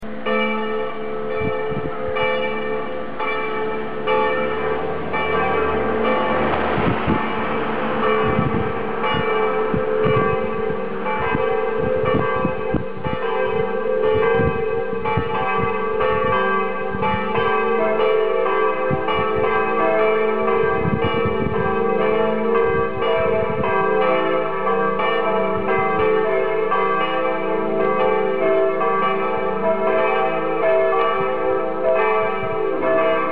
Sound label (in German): glocken